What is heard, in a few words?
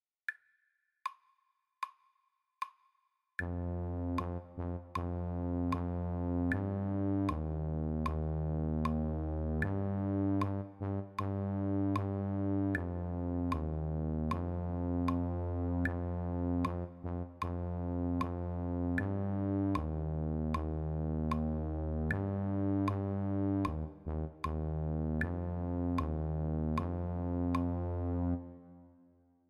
lowbrass tuba